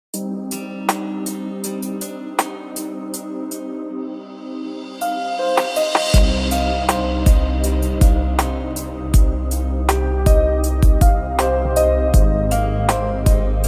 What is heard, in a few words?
романтическая музыка пианино romantic piano music